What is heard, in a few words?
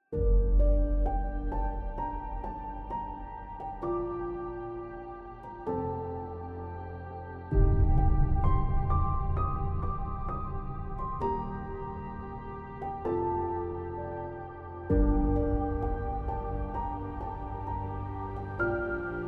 Atmospheric Piano w atmos and booms; Main Parts